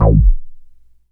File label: A1, KORG DW8000, Vintage Keyboards; Big Fish Audio - First Call Vintage Keyboards